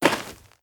Dirt Walk

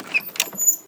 hatch

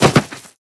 Dirt Jump

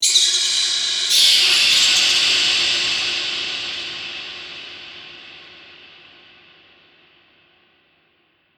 Bats

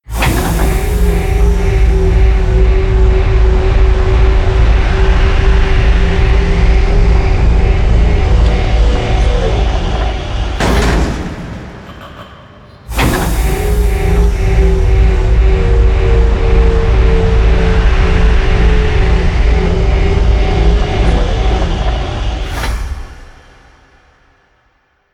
Platform Aborted

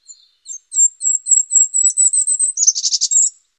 Bird Call; moul-assets

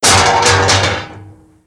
Helmet Hit; moul

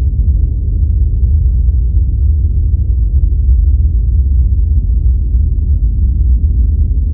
Interior Revolve Loop

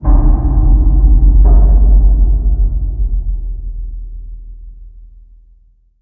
Random Subterranean

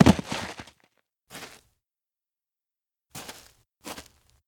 Body Fall

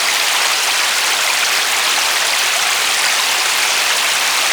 Fountain Loop

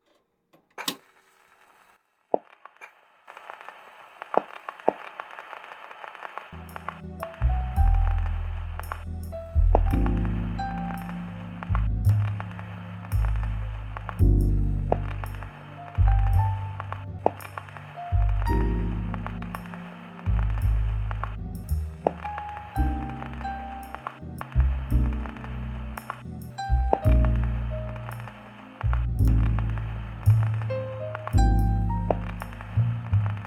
Music

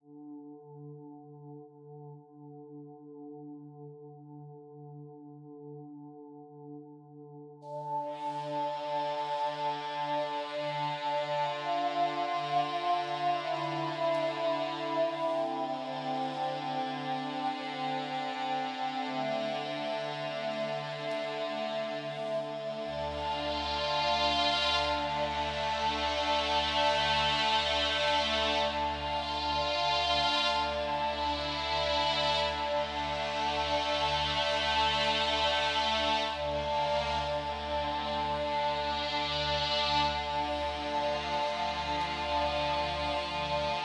Music; VeeTsah

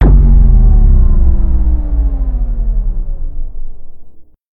Pod Power Down

